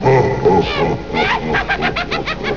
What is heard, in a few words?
laugh; starwars; jabba n crumb